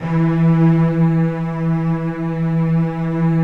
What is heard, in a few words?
Virtuoso Strings, E2; ILIO; Kirk Hunter Virtuoso Strings VOL-2; 90 s Sample CDs